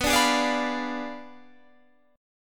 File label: B Diminished 7th B down Guitar Standard; Bdim7 strummed